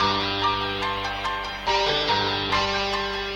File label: guitar sizzling